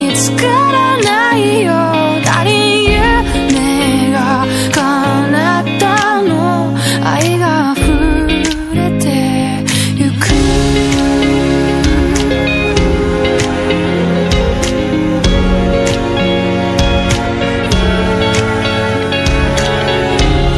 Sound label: japanese ringtone